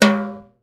verby tom single hit G sharp key, Royality free tome drum sample tuned to the G# note.